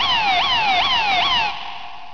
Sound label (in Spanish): policia